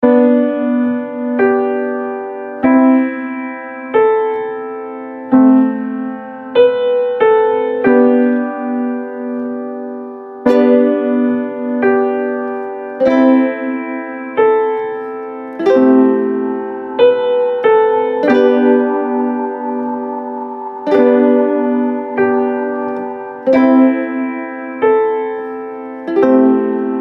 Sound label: alert; Sailfish Ringtones